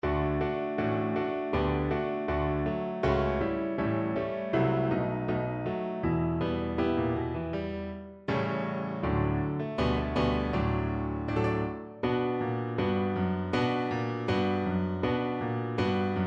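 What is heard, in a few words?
viola karaoke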